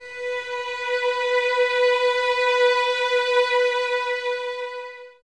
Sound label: String Violins; String Ensembles; Miroslav Vitous; 11 Violins